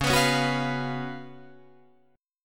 Db 11th Db, Guitar, Drop D

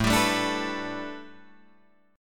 A 9th Suspended 4th Guitar Drop D, chord

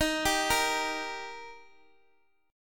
Eb Major, down, Guitar, DADGAD

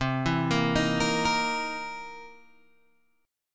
C 7th Sharp 9th, C, down, Guitar, Open G

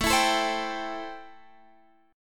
A-Minor 7th A Guitar Standard; x,12,14,14,13,15; Am7 strummed; JGuitar

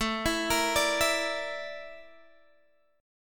Bb Major 7th Flat 5th A

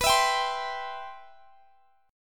C Major B

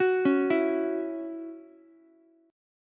C sharp Minor Double Flat 5th, Ukulele; arpeggiated; 11,13,12,x

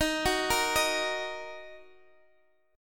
Eb Minor, Guitar Open D

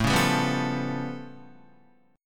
A Augmented 9th A, Guitar; strummed; JGuitar

